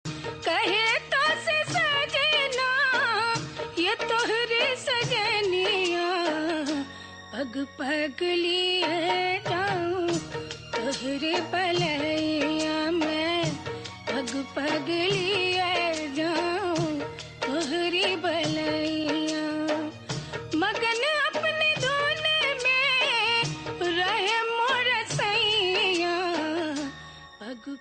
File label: Hindi